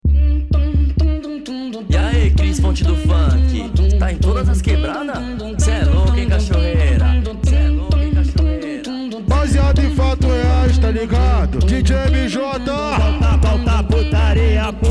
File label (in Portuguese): ritmado